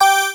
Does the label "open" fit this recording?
Yes